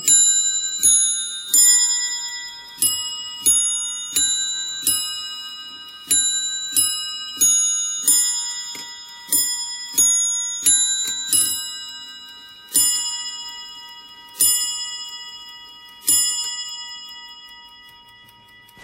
4 bellen, klokken; Hermle

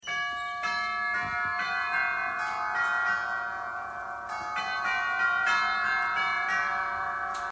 Staande klok chroom; Kieniger

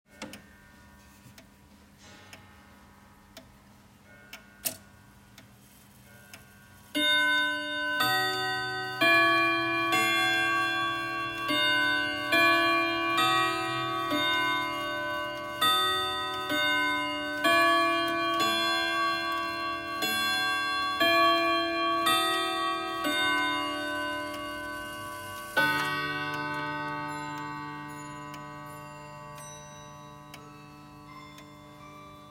Staande Klok; Kieninger

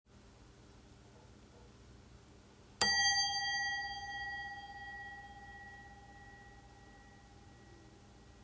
tafelklok; Hermle; wortelnoten

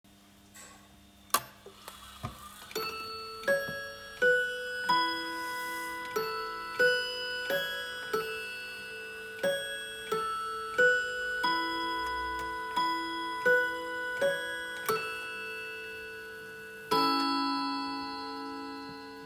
Hermle Mahonie klassiek

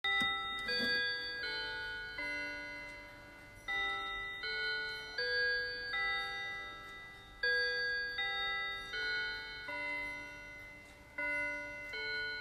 slagwerk, klokken

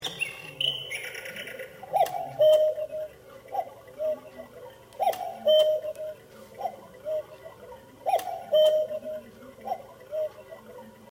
Koekoek modern

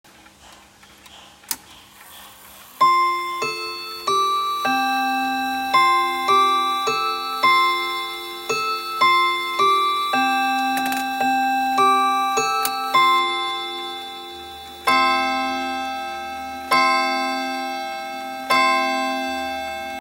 Hermle regulateur zilver

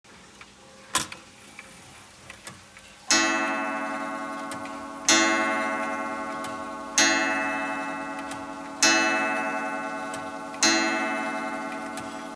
hermle regulateur rood

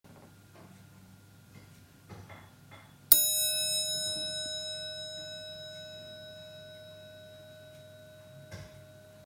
Hermle zwart tafelklok

